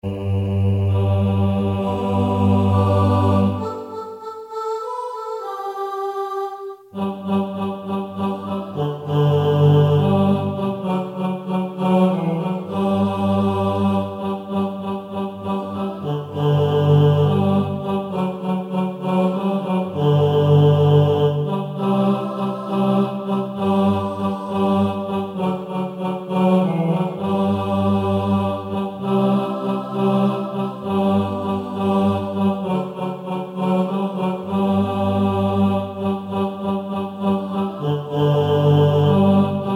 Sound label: TENOR